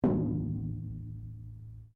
METAL IMPACT QUIET; Metal Sound Effects; Metal (Sound Effects:Materials)

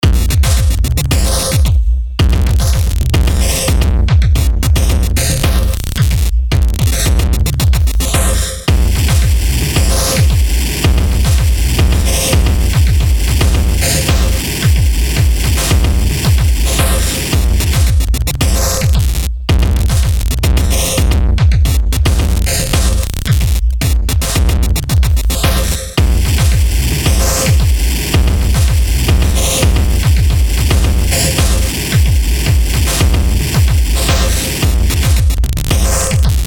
BEAT STATIC, Beat Static Glitch Hardcore; Miscellaneous Loops

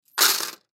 Coin Dimes Drop Drawer; Money (Sound Effects:Objects); Blastwave; Foley

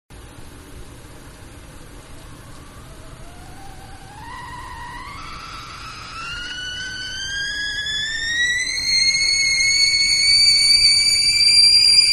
Kettle Boil; Kettle-Coming To Boil; Kitchen (Sound Effects:Household)

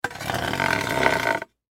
Hand Saw Drag Blade On Wood; Saws; Industry

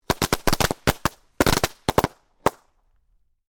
FIREWORKS CRACKER SMALL CLUSTER; Variety of fireworks being detonated

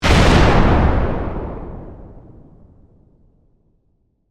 Explosion Heavy; Warfare; Bomb

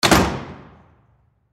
Jail Door Close, Jail Door Close Reverb; Prison Door; Emergency

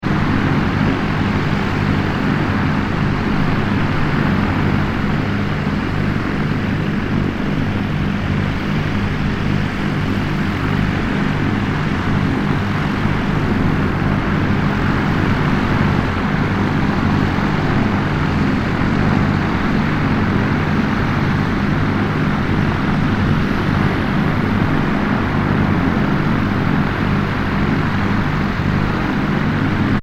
transportaton aircraft twin prop small idle; Aircraft - Twin Prop Light Aircraft Idling; Airplanes; Vehicles; McKinney Sound

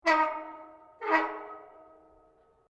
METAL CREAK LARGE; Materials

